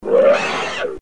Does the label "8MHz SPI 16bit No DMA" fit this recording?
No